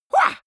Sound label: goblin fight shout attack short, comedy cartoon vocals; soundpool